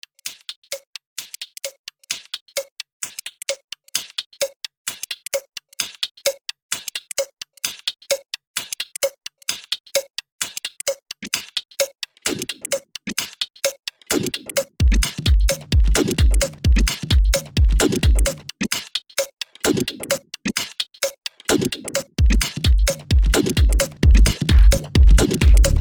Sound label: Waves